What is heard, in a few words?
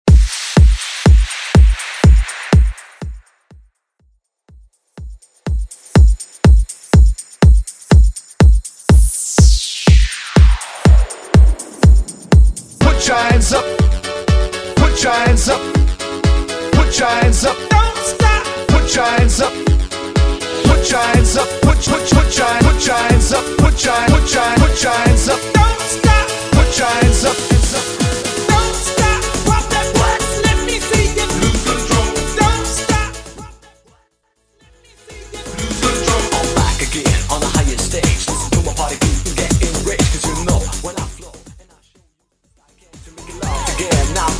90s Dance